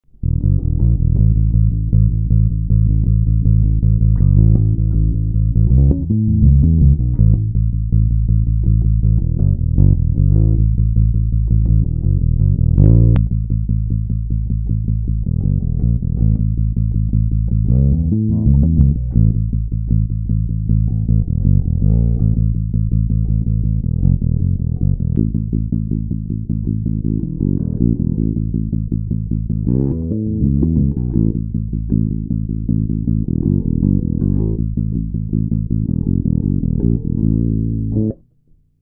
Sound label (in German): StarBass High Cut Finger Hals Beide Bridge